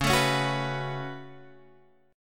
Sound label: C sharp 7th Suspended 4th Sharp 5th, C sharp, down